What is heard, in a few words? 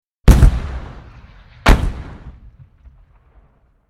84mm medium anti tank weapon, two rounds of high explosive fired (Close perspective); Guns Tanks; BBC